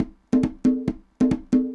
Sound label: congas 140 bpm, latin; Sound Effect Library